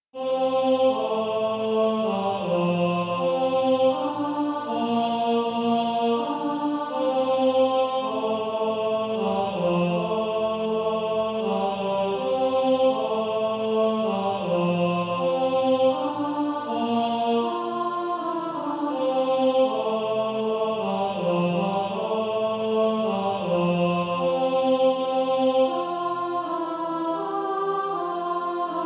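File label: Male Choir, tenor 1; He Leadeth Me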